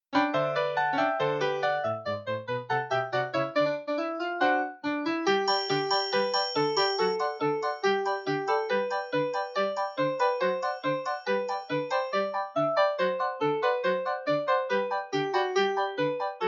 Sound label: ensemble audition accompaniment